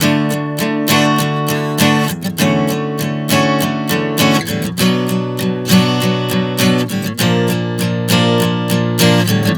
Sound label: D-Dmaj7-Bm-Bbmaj7 100 bpm, acoustic guitar chord progressions